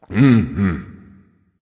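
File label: non-verbal agree; smite; voicepack; houyi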